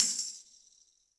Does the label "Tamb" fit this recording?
Yes